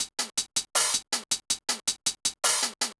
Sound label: Hats Beat, Drum N Bass; Sonic Foundry (Sony Creative Software); Knutrix Drum & Bass